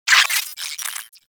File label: Space Drone Attack; Enemies; Warframe; Corpus Space Drone